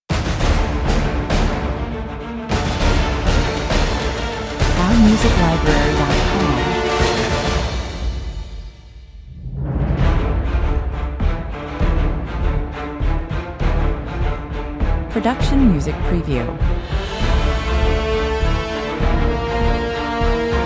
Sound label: Hard hitting and dramatic, epic action music. Full orchestra, huge percussion